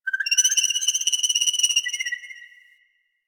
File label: Rock Critter, Ambience; One Offs; Creatures; Warframe; Quests; The New War; Drifter Camp